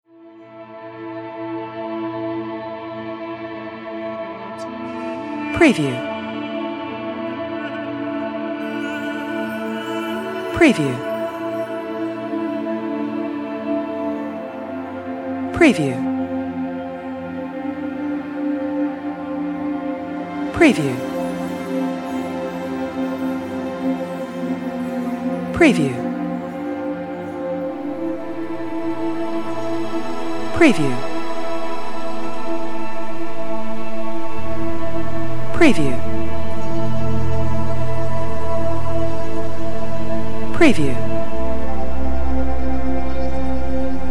Exotic Female Voice Ambient Relaxing Meditation Chilling Pads Background